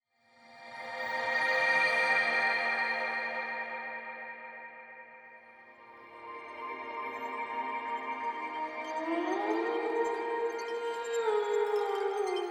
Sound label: Dreamy